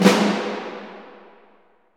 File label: Snare, Roland LA Composer